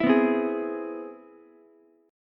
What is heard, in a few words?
C Augmented Major 7th C down Ukulele; strummed; JGuitar